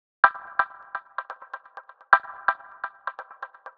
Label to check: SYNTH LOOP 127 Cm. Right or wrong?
Right